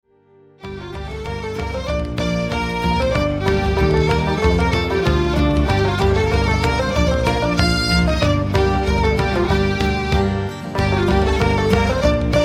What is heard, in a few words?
Irish Trilogy